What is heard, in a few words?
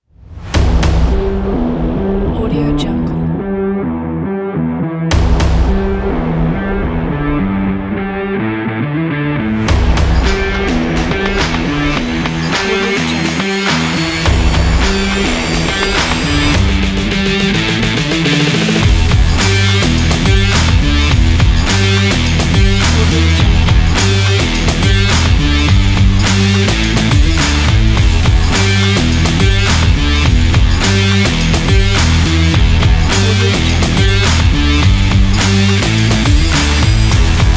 extreme breakbeat